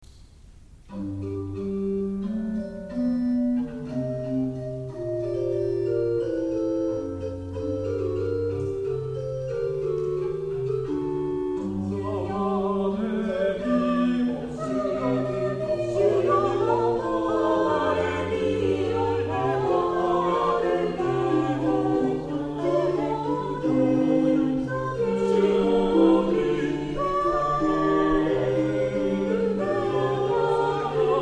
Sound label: Choir Canon of the two people to Jesus; K Shalom Choir